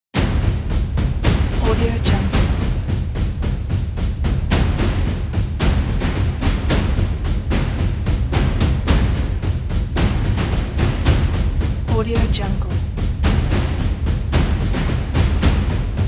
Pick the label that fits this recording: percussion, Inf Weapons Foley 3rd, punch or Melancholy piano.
percussion